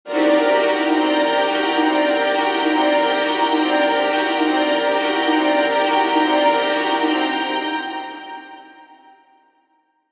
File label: Chase Strings High, Horror Strings